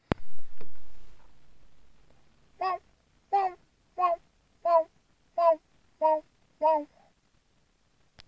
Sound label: Bird Call